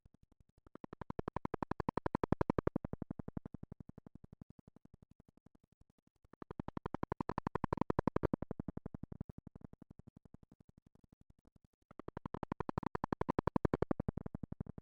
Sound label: Helicopter